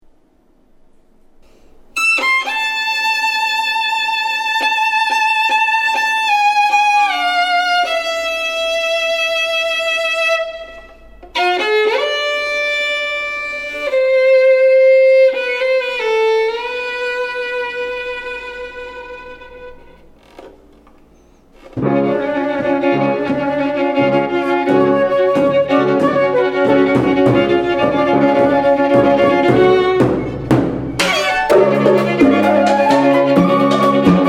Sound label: tango